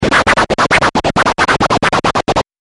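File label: distorted scratching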